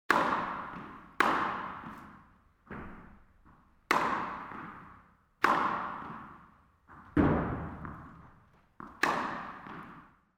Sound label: indoor tennis serve